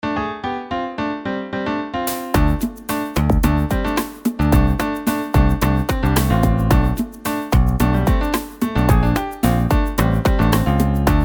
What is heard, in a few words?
Red Heels piano ver